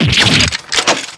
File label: damage